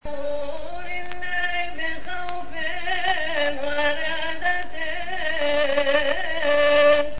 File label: Arabic, Chorus; kodas; deacon; Oosht em Evnoti; Esgodo Lelah; Maahad Faraony